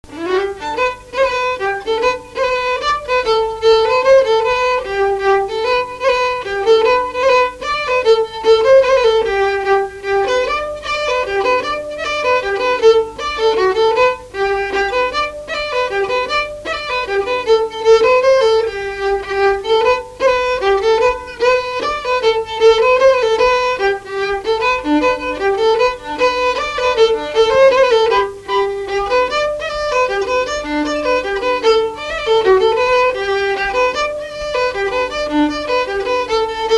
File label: Mazurka; Rassemblement violons FdA 2017